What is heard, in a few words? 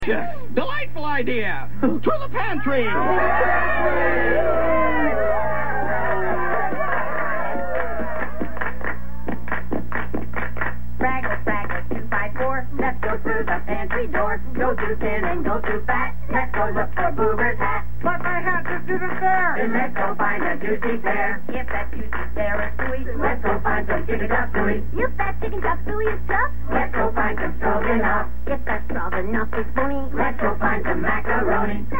pantry chant; fraggle